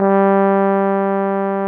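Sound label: tuba G1 stereo; TUBA +CORNET; Greytsounds VOL-1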